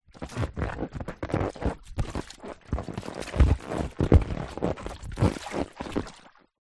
leisure rubber ring inflated movements squeaks in water